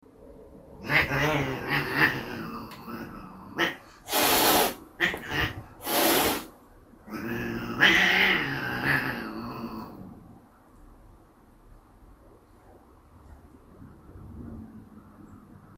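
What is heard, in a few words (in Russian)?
один кот хочет играть а другой нет конфликт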